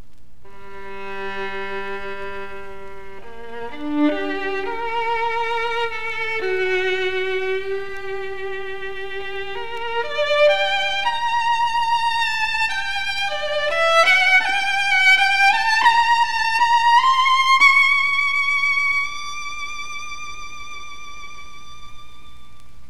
Stradiva, 擦弦, 弦鳴楽器

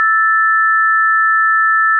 正弦波（定常）2本, 仮想ピッチ; 音の高さ

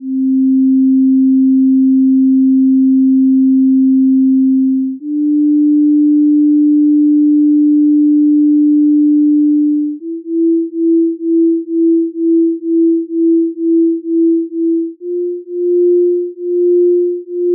純正律とのビート音階, 基のみ; 付録D：音階・音律

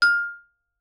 ヴィブラフォン; 体鳴楽器; 打奏

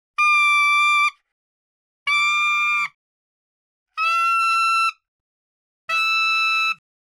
Single Reed, 気鳴楽器; Saxophone

